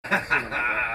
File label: notification